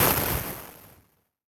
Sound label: debris; SoundsCrate